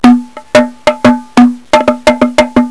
drum

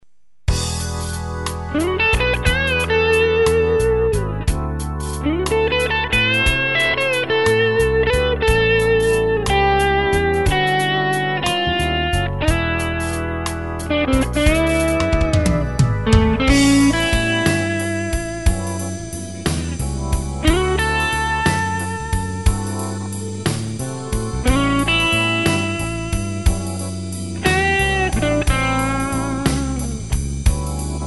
Tweed Blues

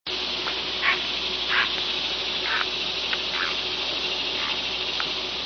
heron, herongb; birdsongs

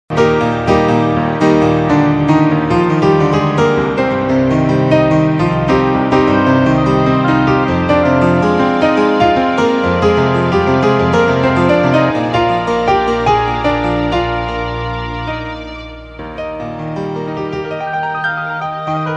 Concerto In E Flat Major

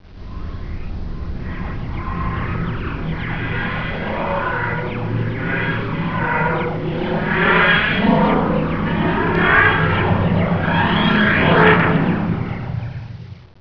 Tardis; drwho

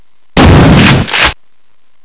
super shotgun

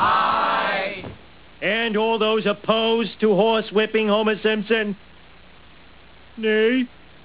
whip

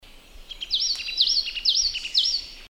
Yellow Tit

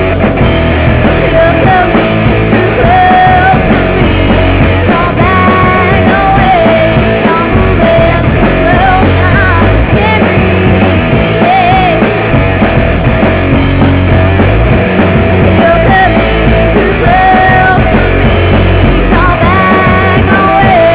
cant breath

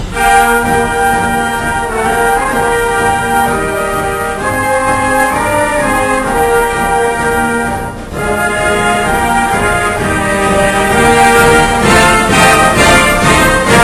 March

